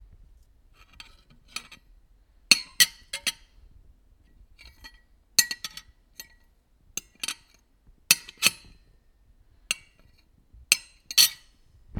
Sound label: Spoon Sound